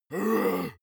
monster growl attack deep; kreaturen

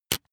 razor fold out hard; maschinen; rasierer

